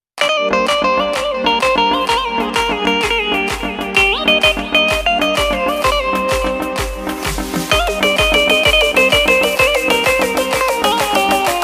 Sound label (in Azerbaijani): gitar gitara remix